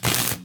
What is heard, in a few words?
Stun Baton